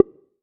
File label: Click